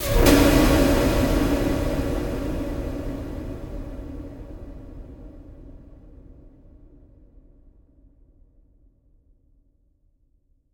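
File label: incidental sound effect faction vampire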